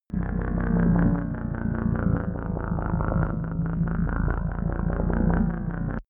alien scout ship; raumschiff

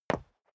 step dress boots concrete single stair up; menschen; schritte

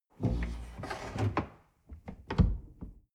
dishwasher door close

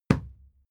fight impact sweetener cloth muffled; krieg-waffen; nahkampf; soundpool

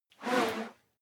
whoosh whistle slow steady; swooshes-swishes

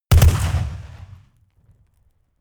rifle ak-47 exterior fire double big; comedy cartoon; pistolen gewehre; Pistols and guns

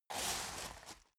step boots gravel medium scuff; schritte

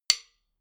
drumstick hit hard, percussion

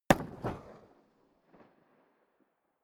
rifle ak-47 exterior fire single distant; comedy cartoon; pistolen gewehre; Pistols and guns

